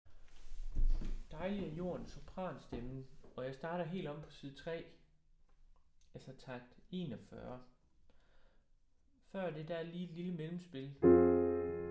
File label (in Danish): sopran; harlev koret